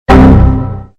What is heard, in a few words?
windows error bass boosted